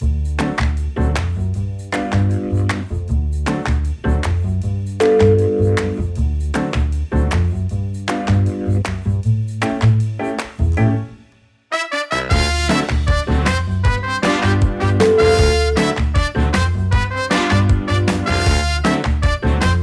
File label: funk fever